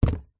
household kitchen knife metal set down on cardboard box; zapsplat